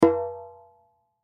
Goombay Drum Hit, Goombay Drum Hit Single; Drums; Musical; Blastwave

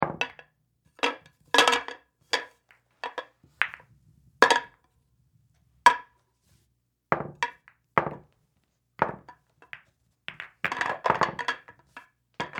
Sports Billiards Rack Balls Pool; SFX Bible

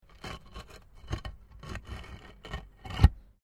PAVING STONE MOVE, dragging; Material components for constructing more complex sounds; Objects

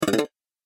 Vibrating Boing; Boing Sound Effects; cartoon

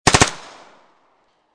MP5 9mm 5 Burst Field; Machine Gun; Machine Guns; VRSonic

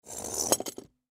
Fire Extinguisher Drag Cement; Emergency

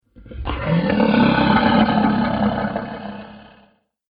Dinosaur Trex; Dinosaur Sound Effects; animal

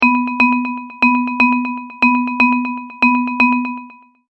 Multimedia Tone-Thinking/Processing

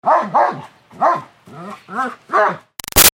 Dog German Shepherd Barks Moves; Dogs; Animals

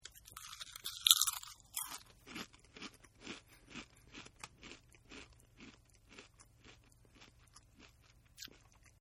Chomp Potato Chip; Eating; Human

